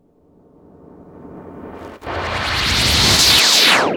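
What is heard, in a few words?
FX right, attacks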